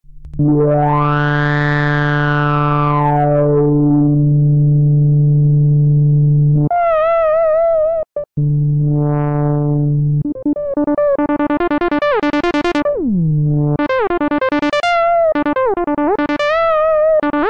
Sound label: 303 emu